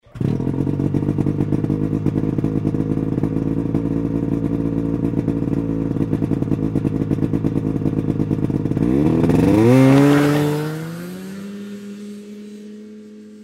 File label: Snowmobile leaves